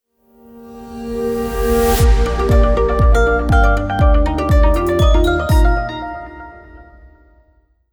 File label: Corporate Transition